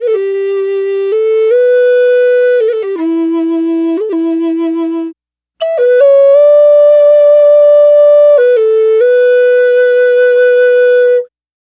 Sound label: Native Flute, Native American; Sonic Foundry (Sony Creative Software) - Continental Drift Aftershocks